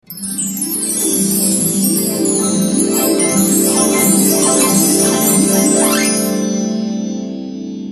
sound effects messag

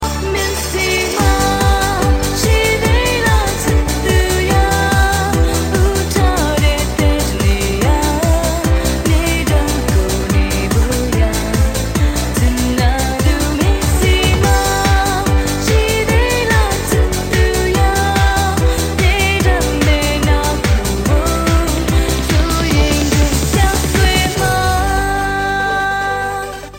remix

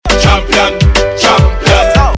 ringtone

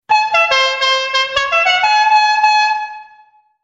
horn; general lee

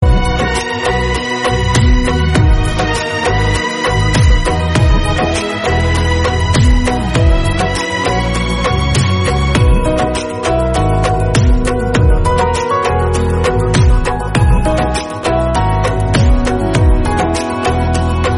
Instrumental

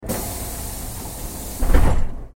door closing sound effect city bus

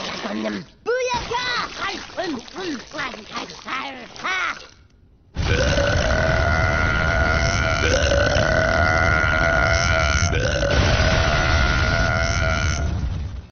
burp; tmnt mikeys

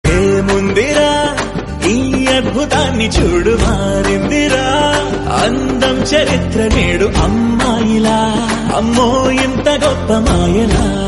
Telugu Song

